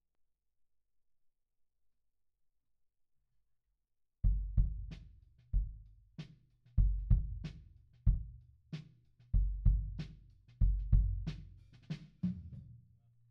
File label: Kick In, 94bpm